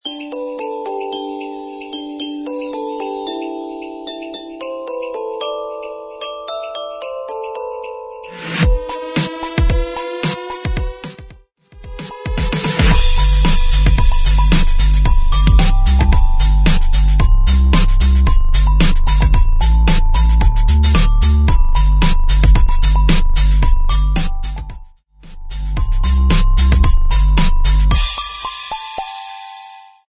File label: 112 BPM Jingle Bells Christmas Electronic